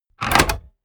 mechanics small snap

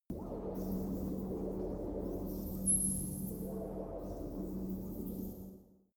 space fabric liquid transport

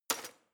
knife wood drawer place hard

